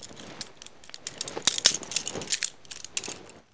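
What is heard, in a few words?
handcuffs; pouta; Mafie 1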